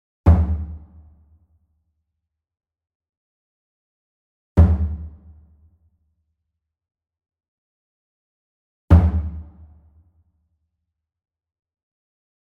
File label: SMALL DRUM Fontonfrom Hit Soft Beater Medium; boom library; Cinematic Strikes Construction Kit